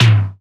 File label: Old Skool House Perc